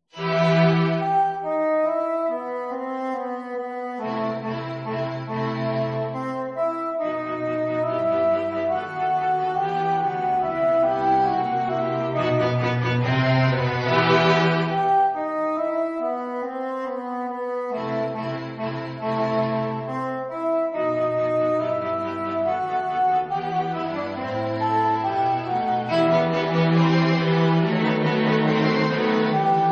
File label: Quartet